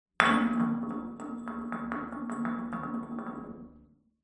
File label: glass settle; ambient; amb oneshot